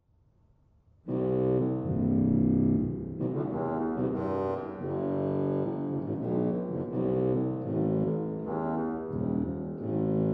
Posaune 16, Sauer; dortmund

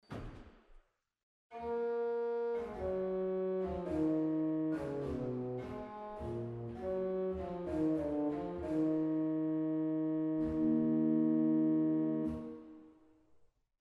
Viol Gamben Bass 8; waltershausen

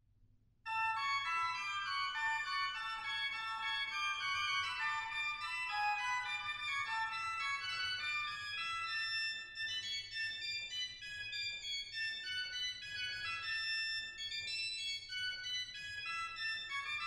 Mixtur, organ; stade

